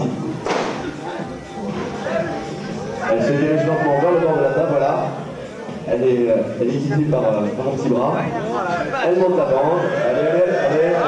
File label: Coff